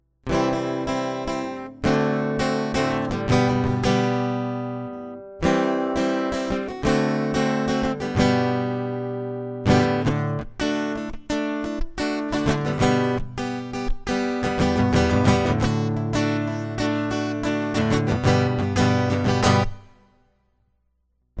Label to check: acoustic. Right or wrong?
Right